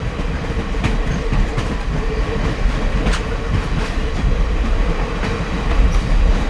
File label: train loop